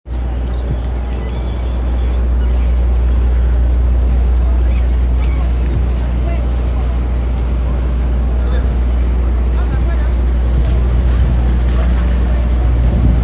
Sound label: Wall Street and BROADWAY